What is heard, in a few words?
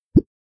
Blip; Astroneer